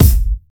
HQ kick sample c sharp key, loudest frequency: 1617Hz; kick drum samples